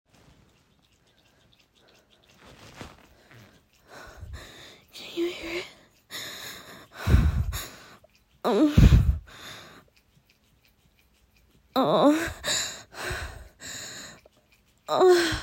moaning for you, wet noises, moaning, some talking; F4M; Erotic audio